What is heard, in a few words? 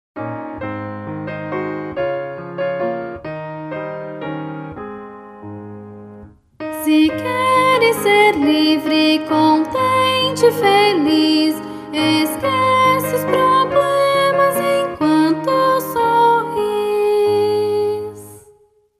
happy people words and music, childrens songbook portuguese